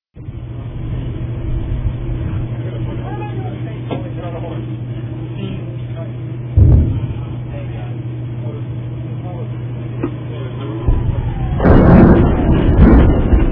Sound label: rockets attacks; aug 1969 and apr 1970